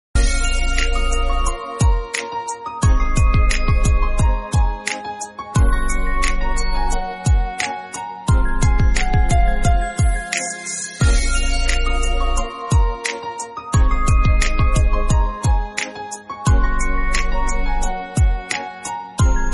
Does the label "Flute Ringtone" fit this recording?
Yes